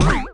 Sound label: Bouncy Shield Bounce; Subway Surfers City; Android, iOS